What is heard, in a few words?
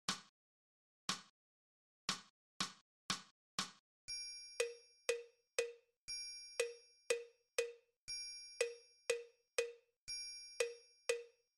Snare